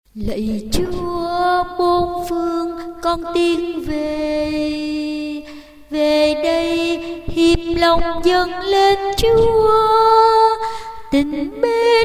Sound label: tenor basso